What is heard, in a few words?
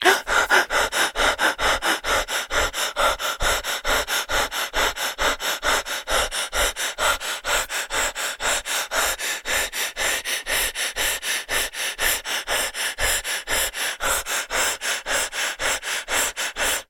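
human male breathing out of breath exhausted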